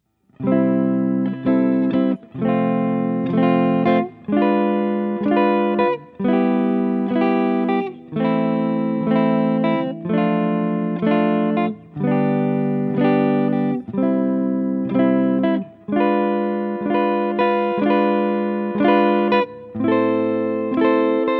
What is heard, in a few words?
Diatonic Chord Progressions in C, guitar